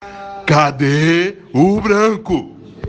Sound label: ahhhhhhhhhhhhh